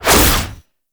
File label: Fist Claw Whoosh; Weapons; Warframe; Tenno; Incarnon